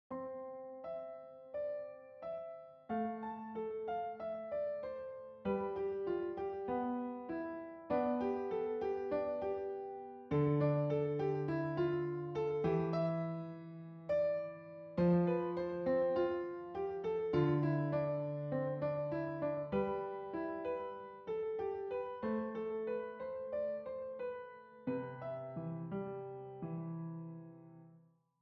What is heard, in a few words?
Piano